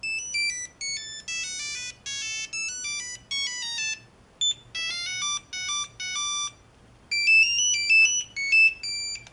nokia 3210 entertainer ringtone

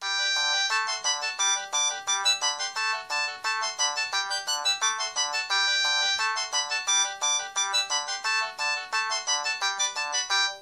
cancan ringtone, samsung sgh t100

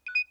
nokia 6100 ringtones; polite

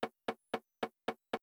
nokia 2600 willow ringtone

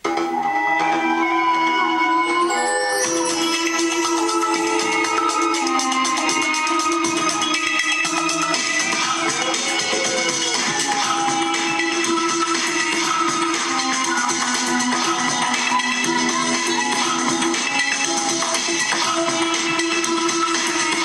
tribal summoning ringtone; samsung galaxy s3

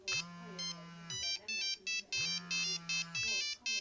nokia 5510 ringtones jumping

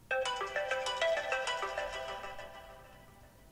apple iphone 5 apex ringtone

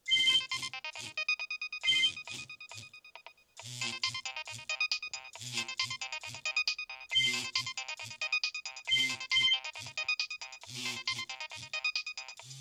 nokia 6100 ringtones disco

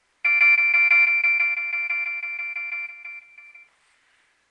nokia3100 ringtone

